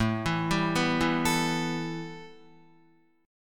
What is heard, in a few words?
A 7th Suspended 2nd A, Guitar; arpeggiated